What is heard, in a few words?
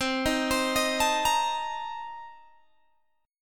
C Minor 13th C Guitar Open G